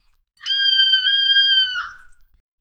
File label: eagle cry in the wild; bird call